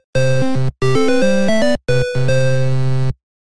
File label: Bonus Fail; Alleyway